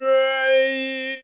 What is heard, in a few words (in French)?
voix; TamTamMicro